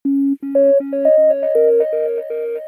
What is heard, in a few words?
Oneplus Nord Notification Ringtone; ringtones